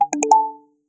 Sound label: Notification Ringtone; Tecno Camon 16 S